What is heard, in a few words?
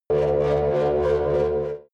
drone wiggle; energie